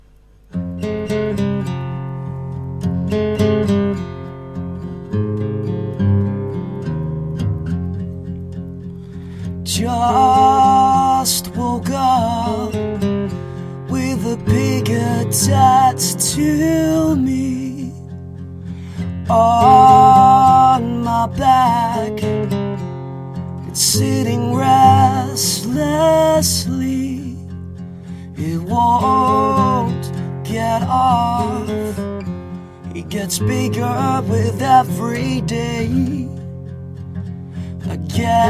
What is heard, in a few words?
inner animal acoustic